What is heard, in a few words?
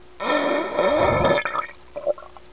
burp